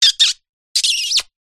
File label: human kiss loud; zapsplat